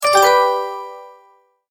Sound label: multimedia tone, Goodbye